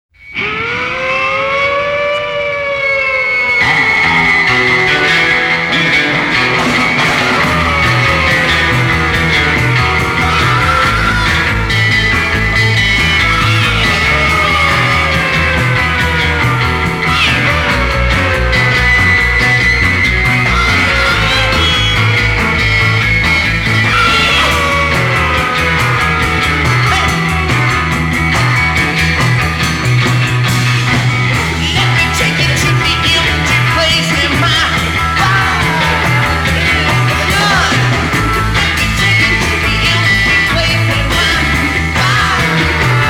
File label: mono 45 rpm mix